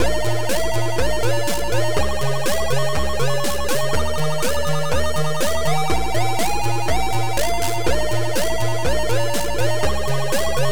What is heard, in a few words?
TECHNO